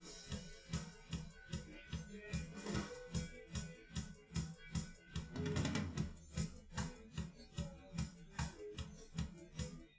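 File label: with drums live rec 1m sim; SASSEC07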